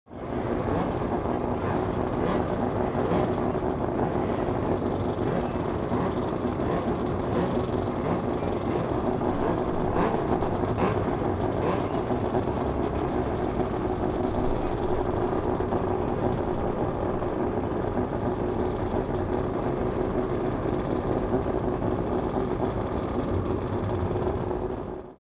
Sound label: racing car f1 multiple idle rev distant